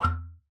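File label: E3, bass flute; Xsample VOL-2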